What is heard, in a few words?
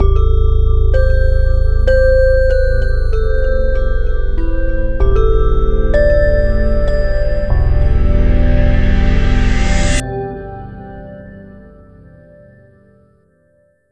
Windows NT 10.0 Startup; Windows Never Released Soundpack 1